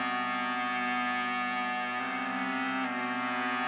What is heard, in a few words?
E-bow; Ambient Cinematic